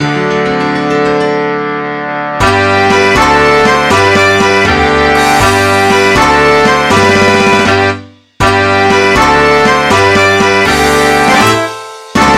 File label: Pop Goes the Weasel Instrumental